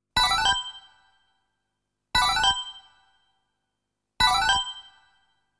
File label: Pause SFX